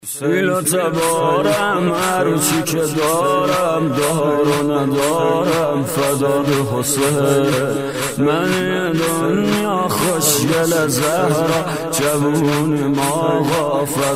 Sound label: Mobile ringtone